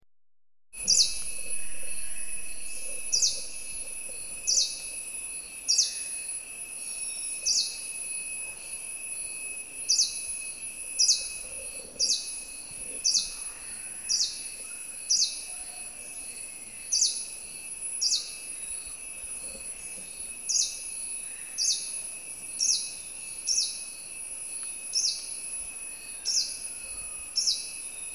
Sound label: Greenish Warbler, Thekkady